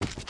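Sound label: Small Man Run; Knight Online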